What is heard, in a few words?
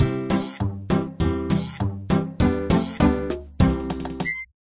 Construction level up